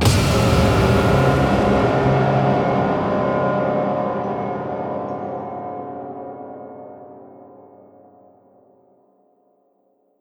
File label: Jumpscare